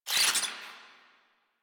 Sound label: Infested Ashen Vocal Pain; Warframe